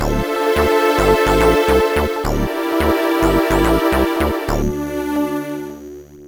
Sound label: game over, trackers; Acid Re-release